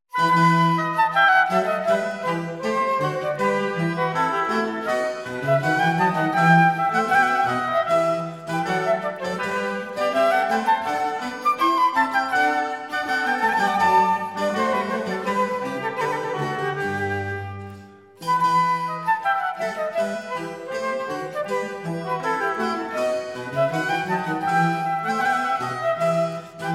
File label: Trio sonata e; Handel